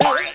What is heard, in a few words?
banana impact